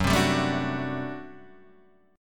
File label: G Major 7th Suspended 2nd Suspended 4th F sharp